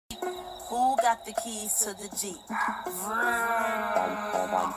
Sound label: Jeep